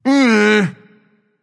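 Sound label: tongue, non-verbal; smite; voicepack; merlin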